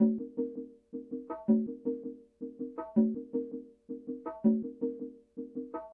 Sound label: bongos and congas; percussion